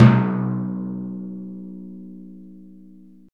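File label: Timpani, Orchestral Perc; Roland LCDP03; Timp Rol Dry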